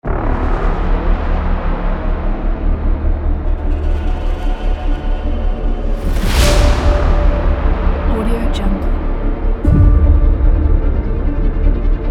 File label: epic hybrid rock triler